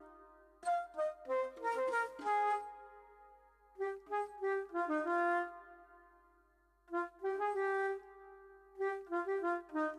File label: MELODICS, viral hiphop